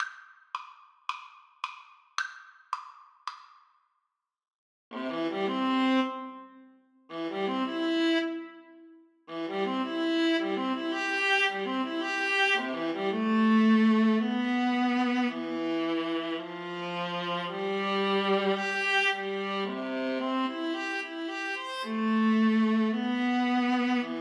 duet

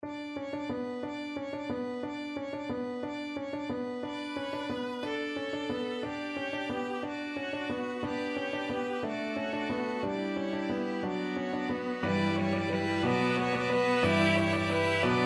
carol of the bells, piano quintet

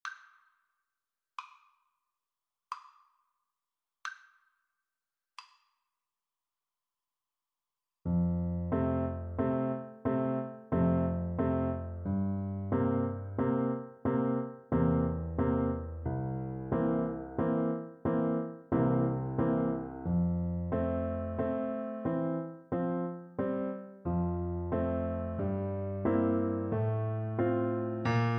beethoven trio in b flat major 2nd FL kar, Flute

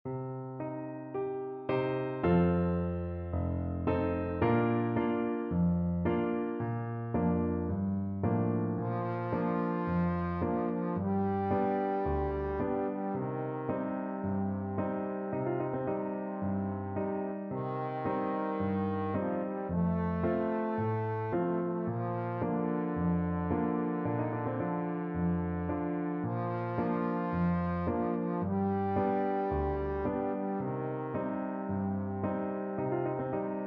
fado, trombone

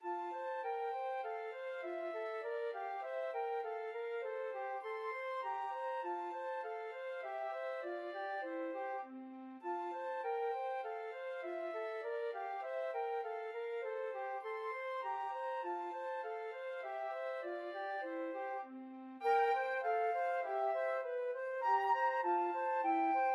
schumann melody flute duet

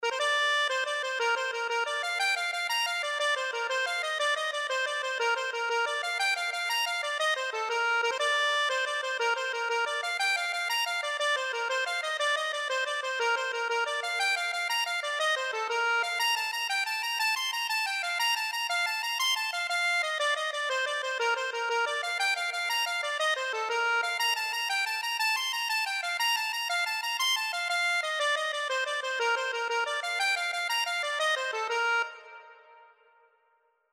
castle donovan, accordion

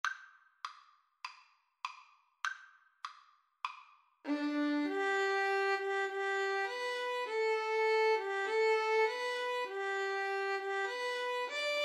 Violin-Viola Duet

